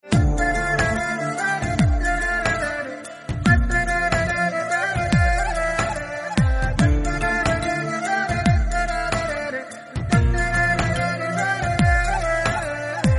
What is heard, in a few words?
INSTRUMENTAL RINGTONE